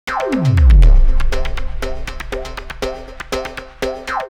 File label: 120, drumloops, DrumMachines; X-Static Goldmine